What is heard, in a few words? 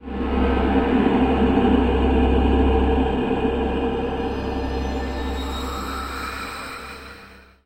teleport; scp173